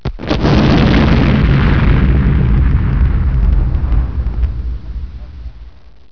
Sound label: dynamite, explosion sounds